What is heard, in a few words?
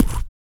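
vox percussion, Vocal Planet Jazz FX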